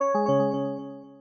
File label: shutdown